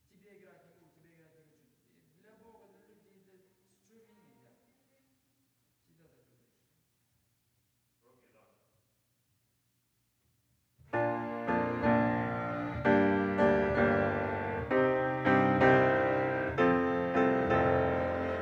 Piano

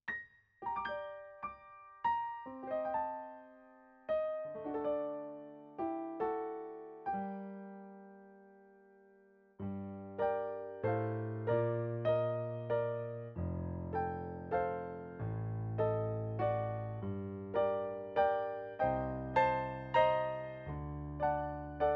Piano

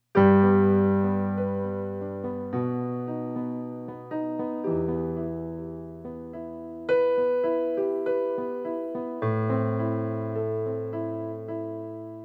piano

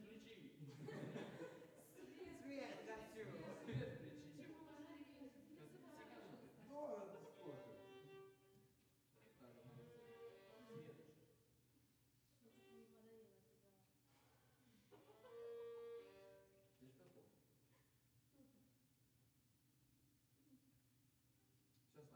Piano

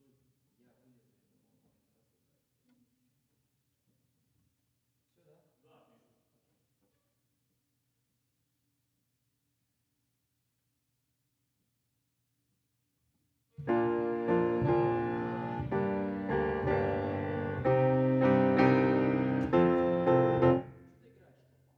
Piano

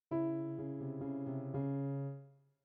Piano

